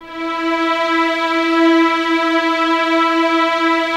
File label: violins E 4, String Ensembles, 23 Violins; Miroslav Vitous